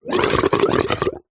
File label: water pipe gurgle; nature